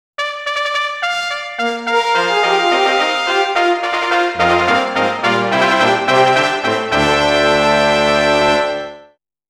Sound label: Flashy Eager Fanfare; Monster Farm 2